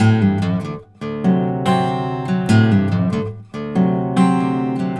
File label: BARI RIFF, Blues Guitar Samples